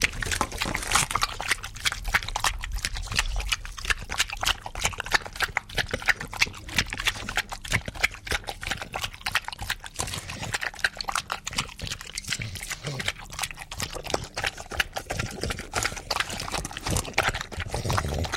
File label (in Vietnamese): con cho lon uong nuoc tu bat